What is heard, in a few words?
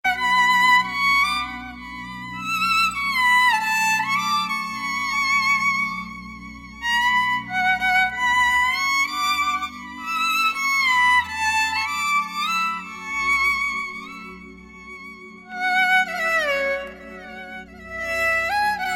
sweet instrumental